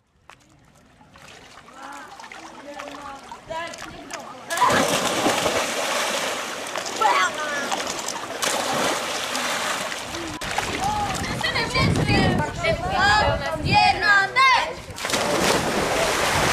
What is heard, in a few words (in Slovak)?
kupanie v bazene